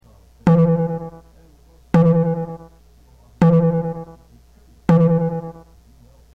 not metal

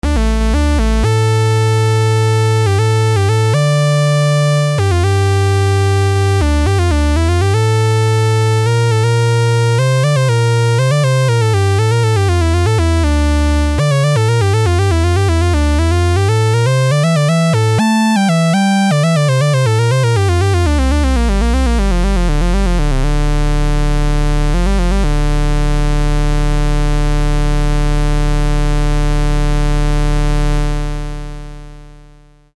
raw tri saw; tellun

